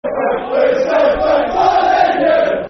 The South Bank, Football Chants; Wolves; wolverhampton wanderers; fanchants

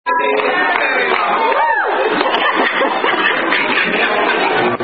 birthday song

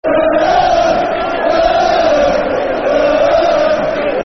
De Der Der Der De, Football Chants; Birmingham City Bcfc

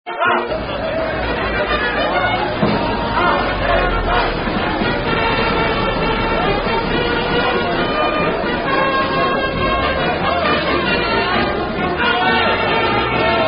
French Rugby Songs; Longue Nuit

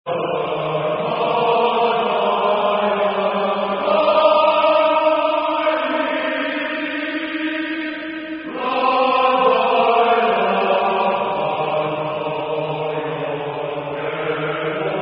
Land Of My Father, Calon Lan, Male Voice Choir; LLanelli Male Voice Choir

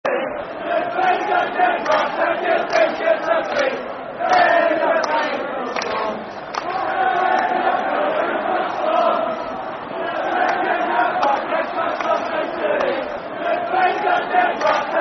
Football Chant (Soccer Songs); In Yer Fife Slums; Falkirk Ffc

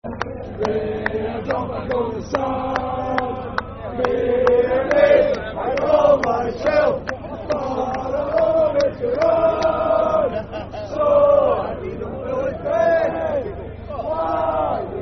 Football Chant (Soccer Song); Scotland Football Team; Scotland Scottish

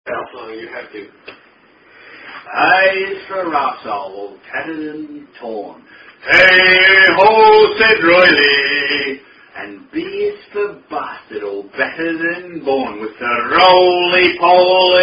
Alphabet Drinking Song

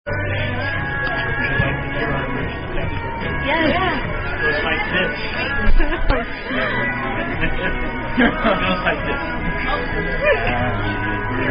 Happy Birthday Sung By The Park Ranger; Tune Vulga Boat Men

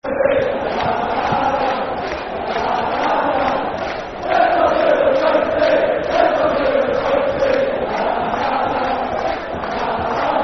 Football Chant (Soccer Song) N. Ireland; Lets All Do The Bouncy; N.i.f.a

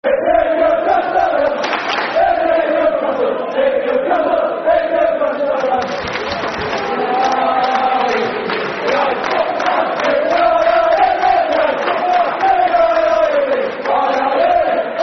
Football Chant (Soccer Song); If You Hate Newcastle; Sunderland Safc

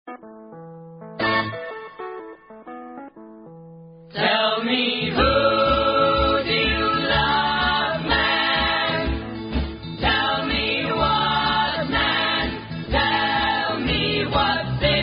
Electric Blues; 1968

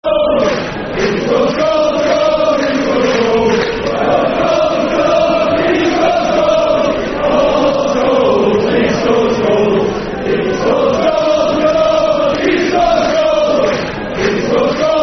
Paul Scholes, Football Chant; Man United

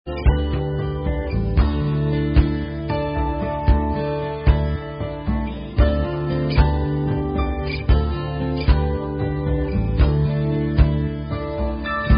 Karaoke Songs; Girl Scouts

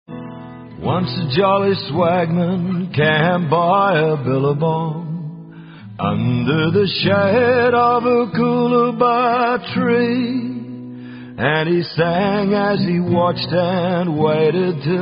Waltzing Matilda Acoustic Version; bundy rum rugby and rhythm

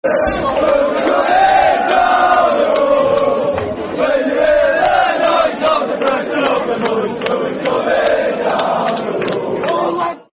We'll Be Coming [Football Chant (Soccer Songs)]; Preston North End Pne

